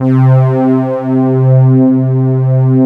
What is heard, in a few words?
LUSH BED